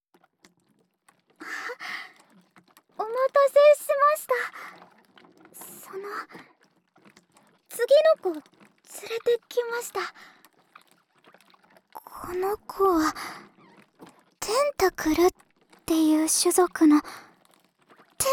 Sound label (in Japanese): 『テンタクルで体中マッサージ』, ASMR